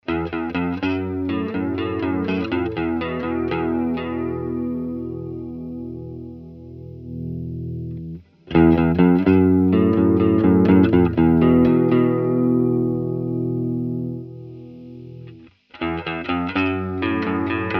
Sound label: Analog chorus